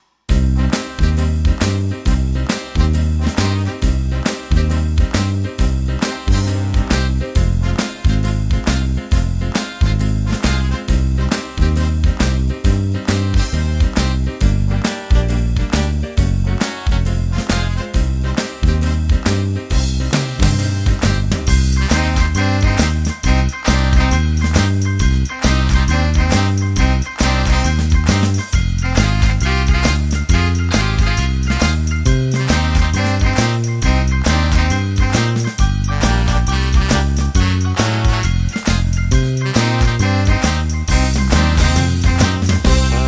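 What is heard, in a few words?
Shuffle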